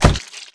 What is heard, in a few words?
body medium impact soft; Garry's Mod; tf2